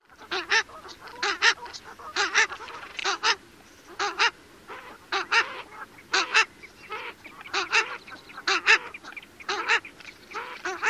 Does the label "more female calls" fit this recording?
Yes